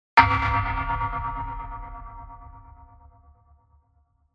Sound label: Impacts designed; soundbits